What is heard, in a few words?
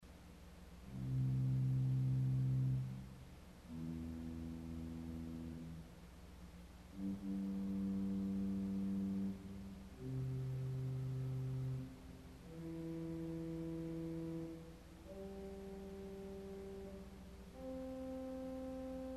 Erzahler Arpeg, organ stops; CulverAcademy